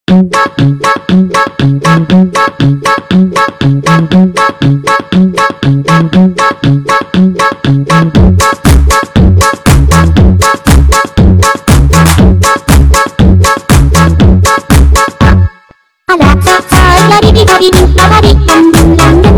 polkka; fortnite emotes

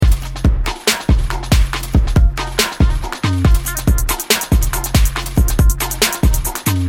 emote worm music; fortnite emotes; athena

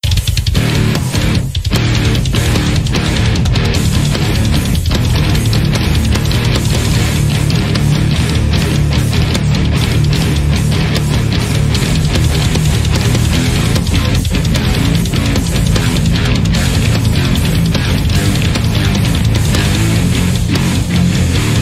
headbanger music; fortnite emotes

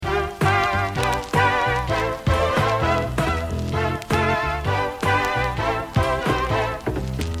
emote flapper music; fortnite emotes; athena

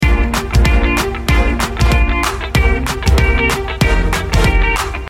emote fresh music; fortnite emotes; athena

